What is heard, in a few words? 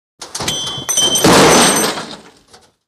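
Medium Crash Metallic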